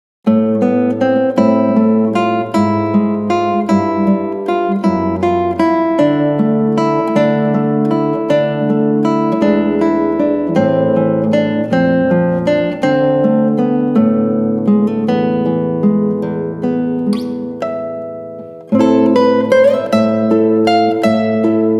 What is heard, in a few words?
Guitar